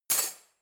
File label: cutlery knife drawer place hard